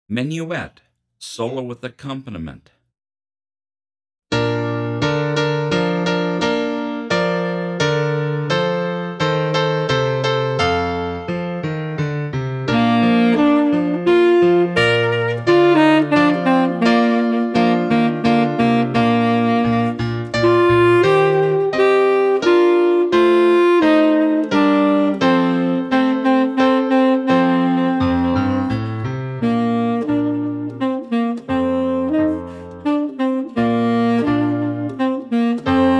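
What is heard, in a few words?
Menuett Tenor Full, Tenor Sax; 30pct Slower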